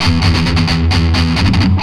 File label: Riff, Industrial; Nasty